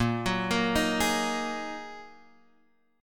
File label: Bb 7th Suspended 4th Sharp 5th, Guitar, Standard; 6,9,8,8,9,x; arpeggiated